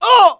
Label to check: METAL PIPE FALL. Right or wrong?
Wrong